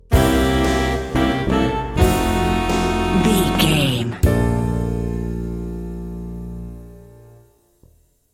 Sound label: thinking things over sting